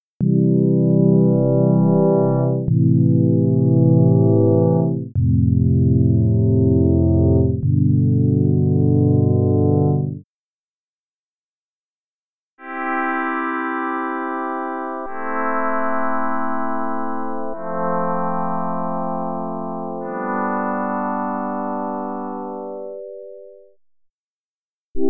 brass pad strings

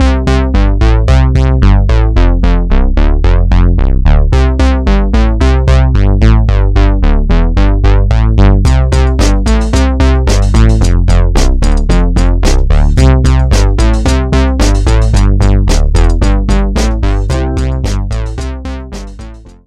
ripping bass

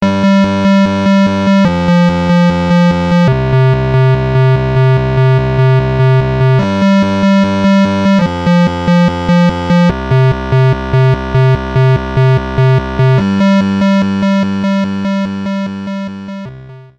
pulse mix

